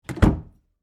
Door Close Hollow, Foley, Door, Close, Hollow, Wood, Basement; Miscellaneous Door